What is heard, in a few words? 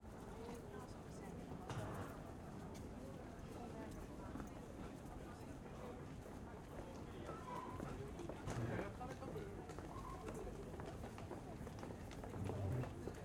Crowd - Stockholm - Subway Station - Tunnel - Busy; Pole Position